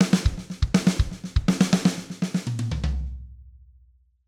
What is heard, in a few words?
Triplet Fill, drum